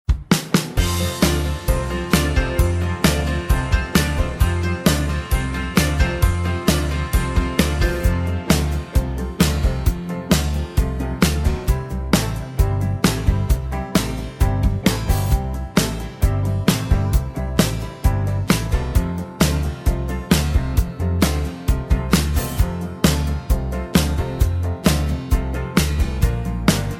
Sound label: (F To G) No Backing Vocals